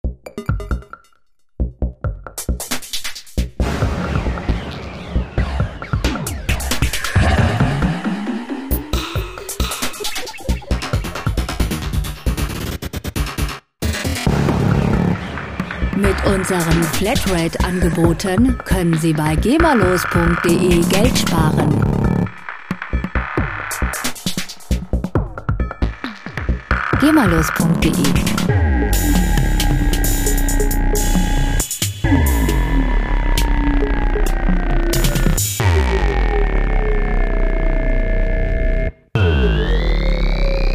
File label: robotic